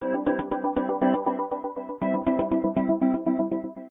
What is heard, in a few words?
free spin waiting, slot; games; Fire And Ice